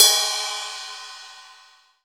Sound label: RIDE, MONO RIDE CYMBAL; AMBRIDE1